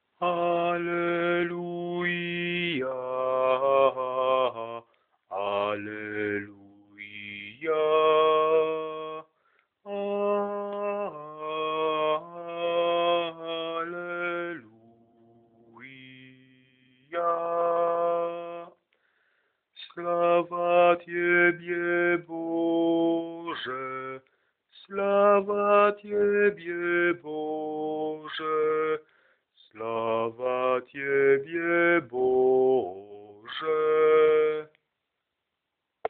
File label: Alleluia bas